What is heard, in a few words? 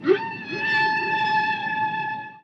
Whale; Rayman 2